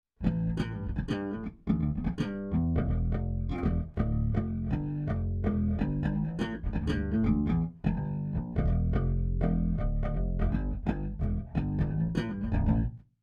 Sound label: bass wet